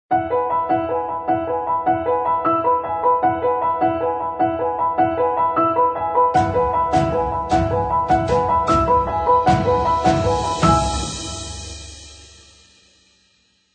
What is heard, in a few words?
uplifting trailer